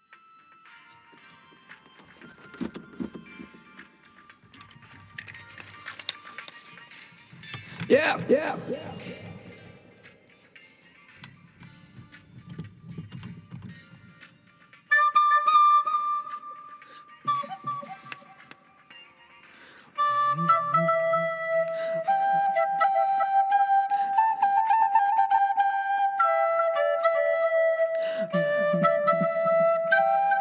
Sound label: FLUTE